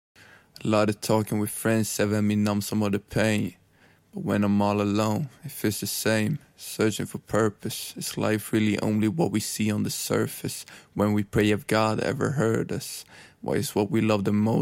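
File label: hip hop rap sing 85 Am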